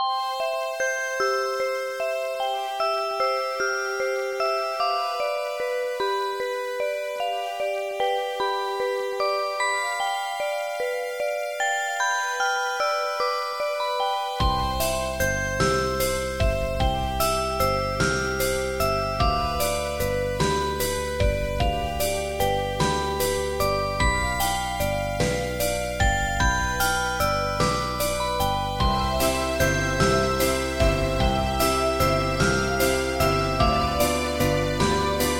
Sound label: WALTZ IT